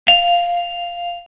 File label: chime high